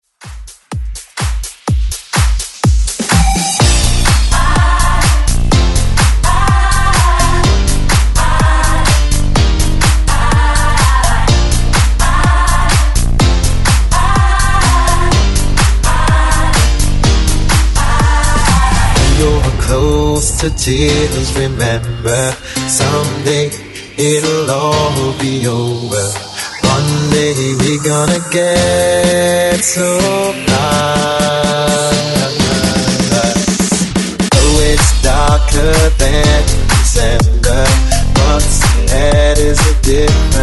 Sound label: Clubmix